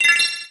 Ding; Breath of Fire 3

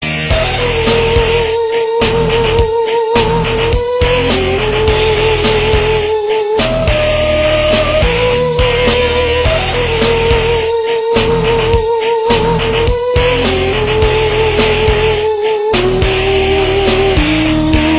Rock

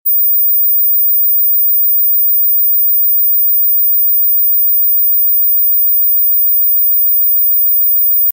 Dogcall High Pitched

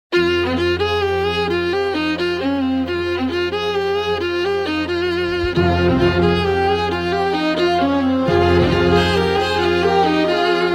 Violon Ringtone

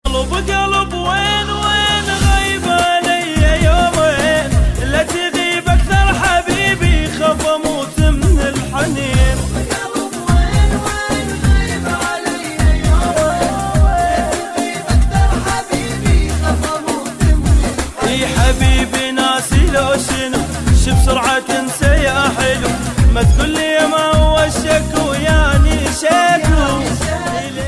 Arabic Tune

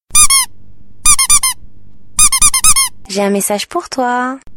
French Sms

